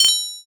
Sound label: counter